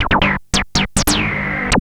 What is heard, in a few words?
Treat Guit, Industrial Nasty